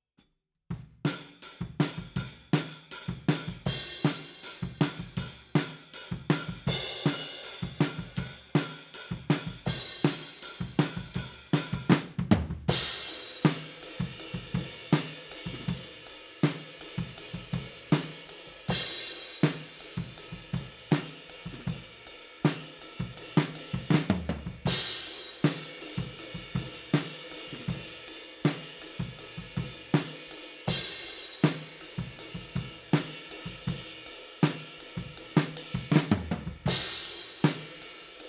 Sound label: Overheads, 160 BPM